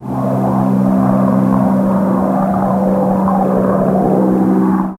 sound of airplane in the, airplanes

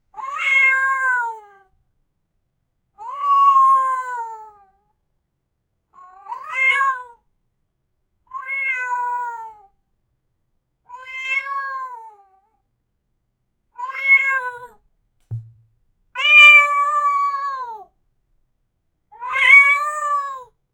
big cat mewing

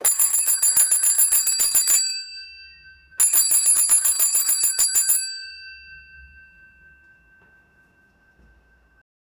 the cycle bell in local market

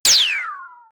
anime sci fi weapon sound

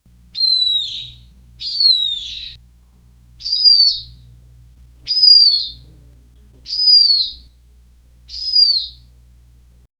miscellaneous animal cal, elephant trumpet

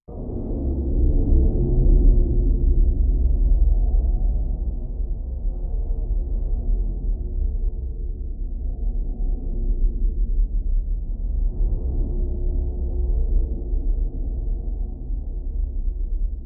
abandoned cold war era un

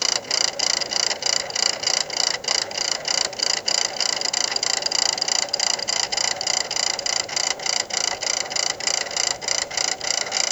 movie projector whirring; cinema

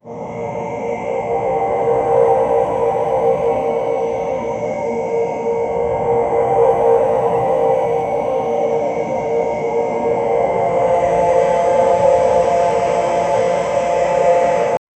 creepy rhythmic disturbin, apocalypse

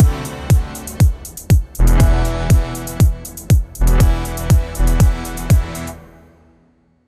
pulse beat slow dramatic

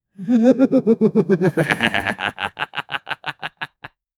dark lord badass chucklin

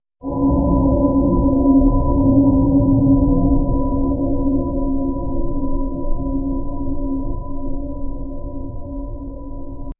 minimalistic horror ambia

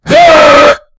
vocalizations

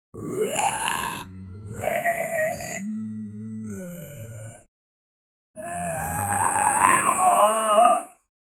zoimbe sound; minecraft

